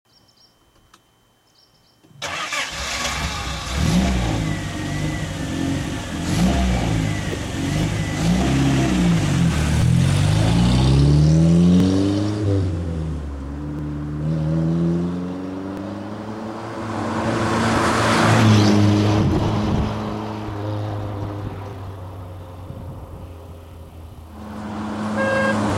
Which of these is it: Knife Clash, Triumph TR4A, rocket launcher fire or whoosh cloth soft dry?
Triumph TR4A